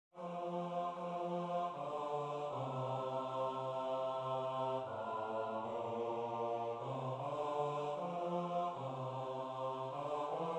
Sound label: bass; The Silver Swan